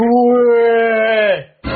huuuwweeeeh

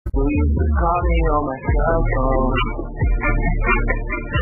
banger song

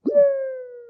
sighing emote

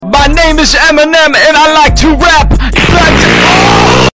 funny rap

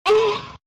groaning anime

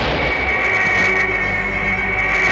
cartoon stuka

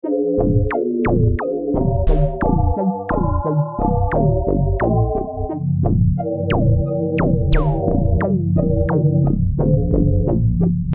shop song

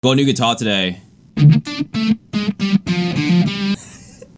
new guitar; dantdms

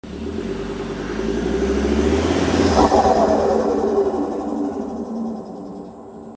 diesel turbo flutter stututu

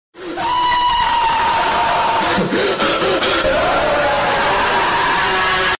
ahhhhhh ouaaaaah

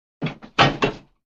metal door unlatches

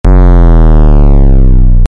distorted base drop

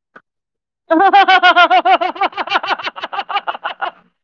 laugh

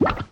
slime bounce; slime rancher

